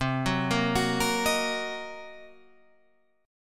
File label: C Minor 7th Flat 5th Guitar Half Step Down, chord; Cm7b5